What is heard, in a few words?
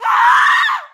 Scream Female; friday the 13th killer puzzle; android ios macos switch windows